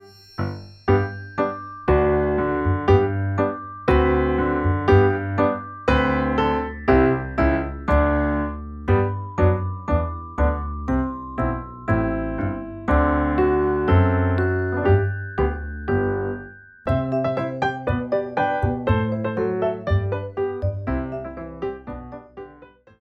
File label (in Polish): wersja instr